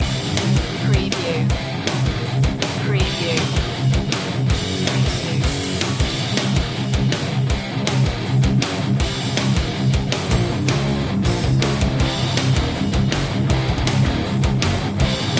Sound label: aggressive action loop